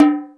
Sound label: Hip House, drum machine